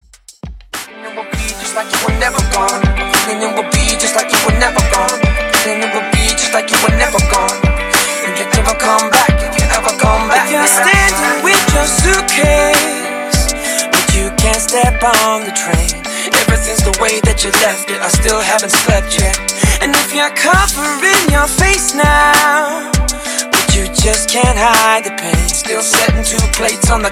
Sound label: Alternative Pop Rock Re Drum 100 BPM